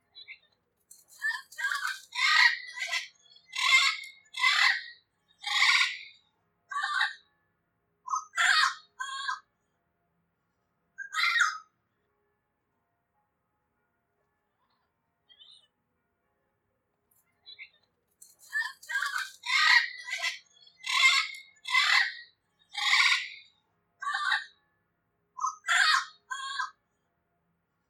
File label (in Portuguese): Canto do Papagaio de cabeca amarela